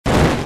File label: explosion B